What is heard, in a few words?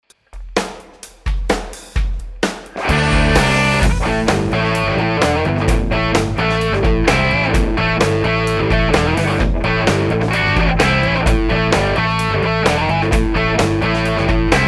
highgain bridge; schwarzbach; HB59-GrowlDogs